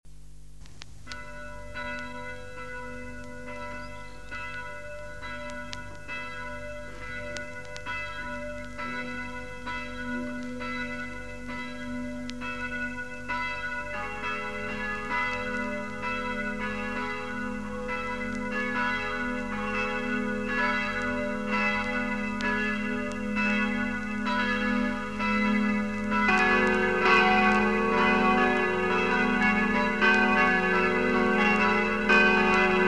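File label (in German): Neues Geläut, die Glocken, die Kirche; 1963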